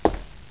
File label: KNOCK, Deuren; sound effect